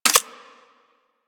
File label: Sniper Rifle Zoom In; Grineer; Gorg